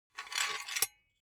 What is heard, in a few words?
object tool silicone gun empty pusher push fast